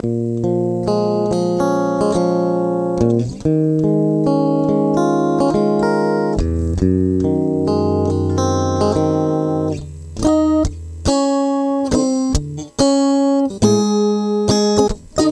guitar

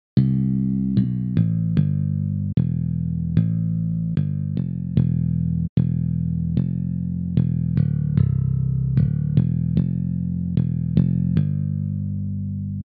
bass only

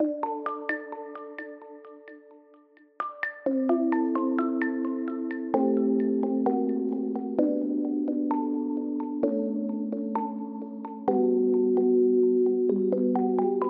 mallet

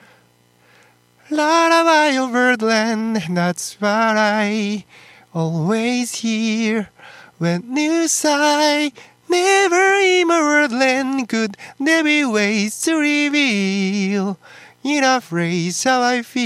vocal bird eq

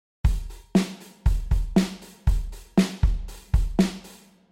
drums

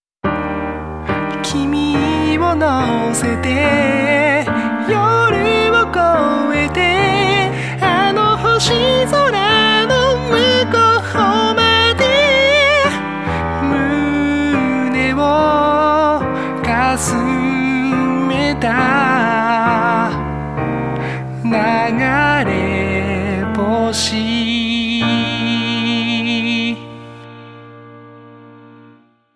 kuso song

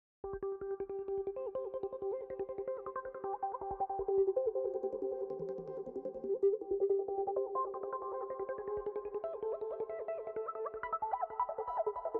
piece arrange fx

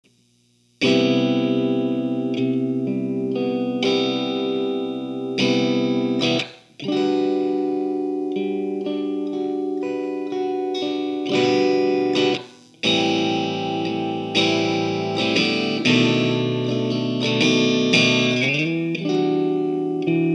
pattern amp

